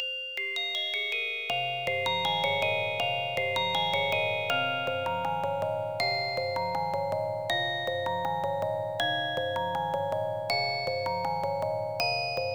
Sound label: tone